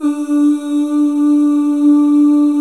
Male Ooz Az; 90s Sample CDs; Club-50 - Foundations Roland

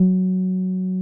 TB-303

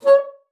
bassoon, C#5; Xsample VOL-6

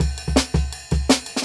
Jungle Breaks; Original Jungle Breaks

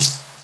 HH, HIHAT; 90 s Sample CDs; Best Service Dance Mega Drums

